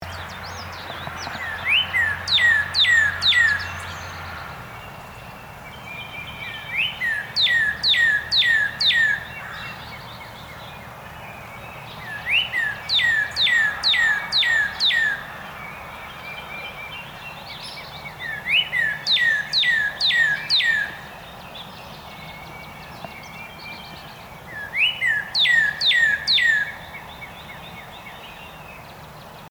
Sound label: Northern Cardinal Cardinalis cardinalis